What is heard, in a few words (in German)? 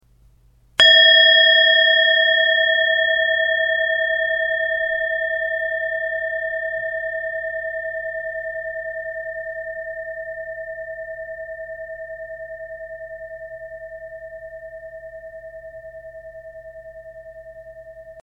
Klangschale Klangprobe